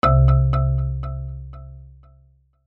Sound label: multimedia alert system